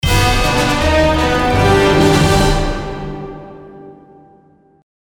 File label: Arena Victory; ratchet clank into the nexus